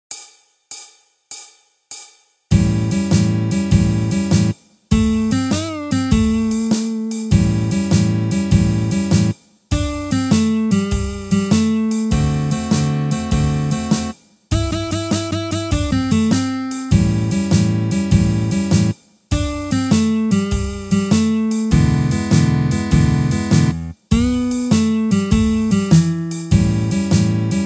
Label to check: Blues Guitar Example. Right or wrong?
Right